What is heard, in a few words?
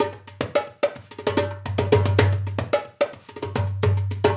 Dumbek; Drums; Essential Percussion